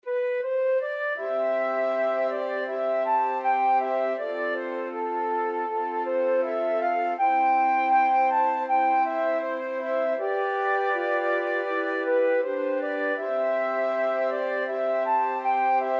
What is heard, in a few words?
flute quartet